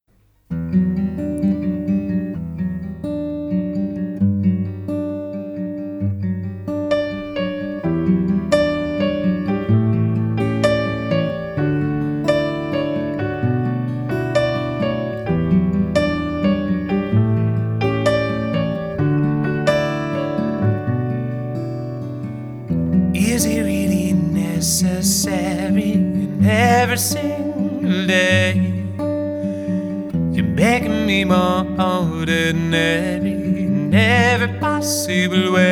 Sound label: Rain (Acoustic Version)